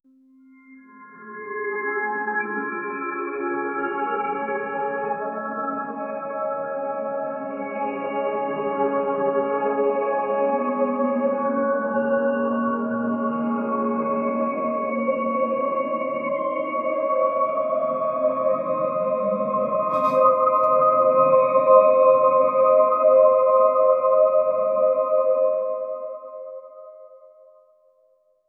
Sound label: music shift to an etherea, notifications